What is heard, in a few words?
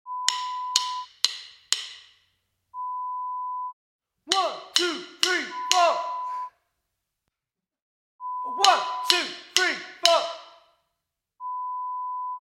Drum Stick Count 1234